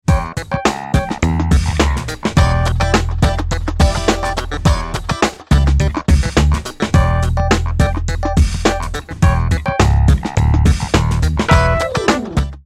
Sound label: Funk